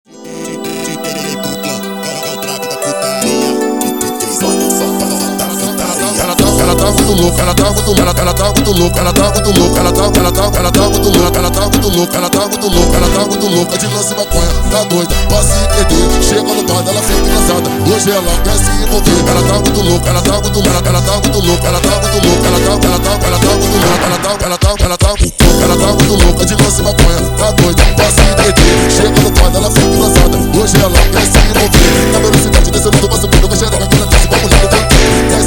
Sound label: Brega slowed; funk